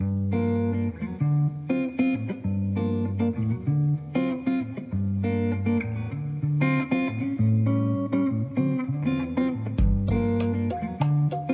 night lounge 432 HZ

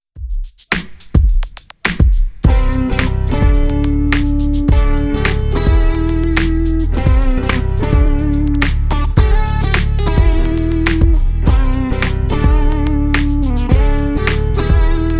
piano beat 432 hz

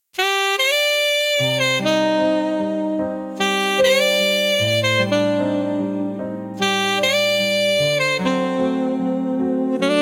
sax

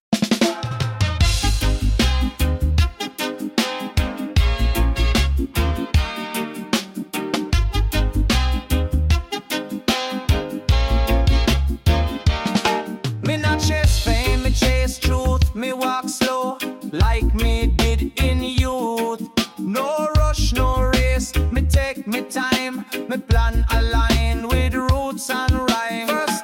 Strategia Reggae

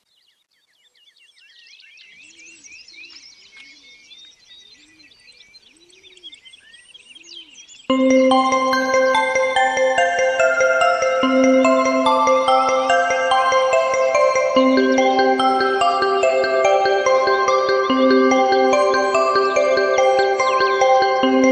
savannah landscape; relax with nature

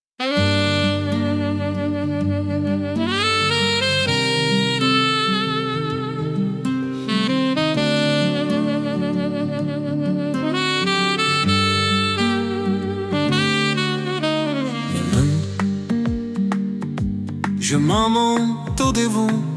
velvet saxophone

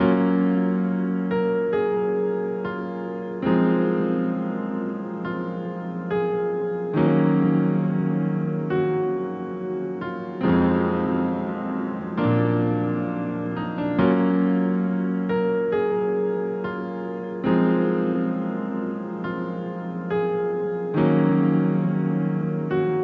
night lounge 432 HZ; NEAR MORNING

